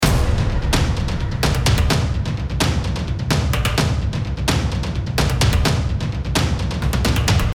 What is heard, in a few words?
music epic